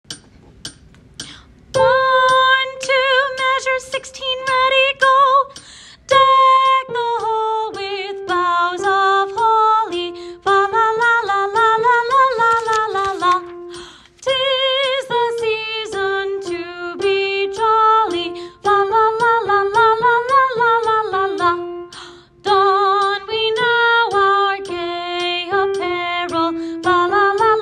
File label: alto